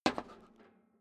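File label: step boots metal rattle single left, footsteps; schritte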